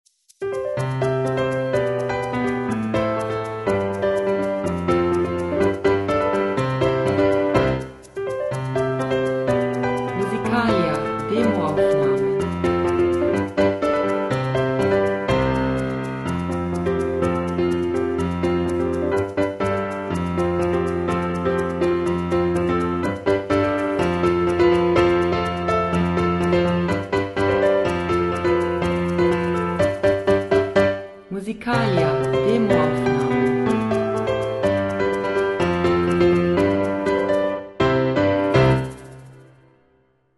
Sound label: just piano song